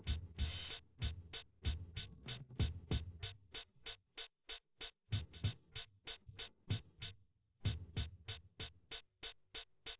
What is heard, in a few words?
wdrums inst sim